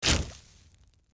Death Burst Small

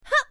Jumping

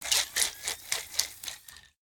Shake; Caffiend Cambassador

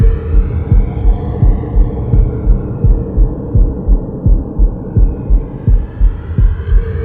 Health Low; bloodrayne betrayal fresh bites

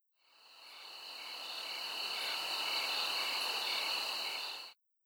Christtheredeemer Crickets

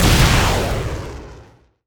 Enemy Boss Common Active Shot; Disney Star Smash; Android, iOS, Mobile

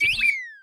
Enemy Bombbumpo Active Throw; gamerip

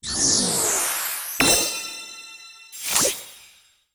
Login Daily Bonus; disney star smash android ios mobile gamerip 2020

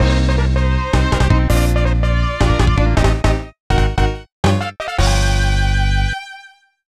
Robot Master Stinger; Mega Man and Bass; SNES

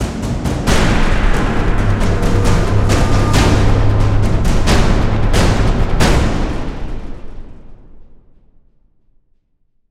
Stinger Fill

